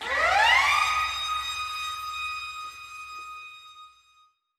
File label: SIZZ FX